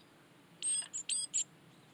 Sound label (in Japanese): シジュウカラ, 【地上危険注意】; 猫が近づいてきたときや突然慌てて餌場から飛び立つため 何らかの危険があると思われるときに鳴く; Parus minor